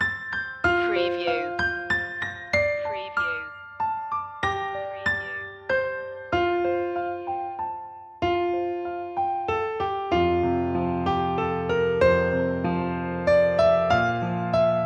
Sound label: the first noel piano loop long; media music now